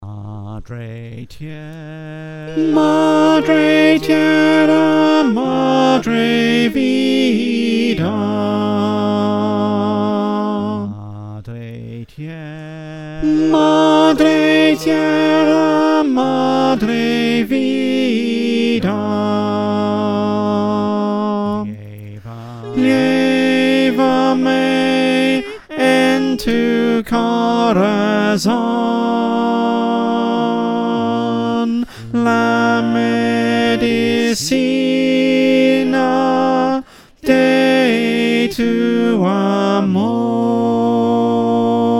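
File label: tenor, choir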